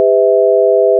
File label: 3tones raw